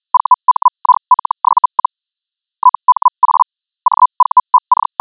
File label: FeldHell